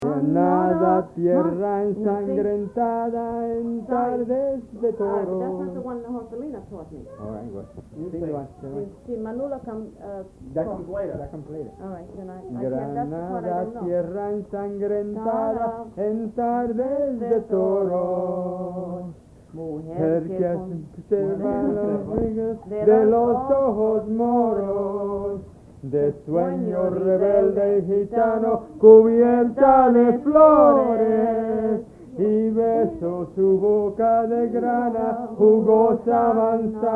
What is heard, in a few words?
Grandma and Grandpa singing